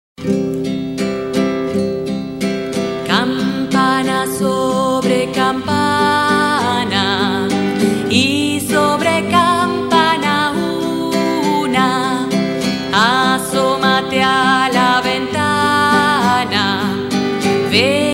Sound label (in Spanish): Campana sobre campana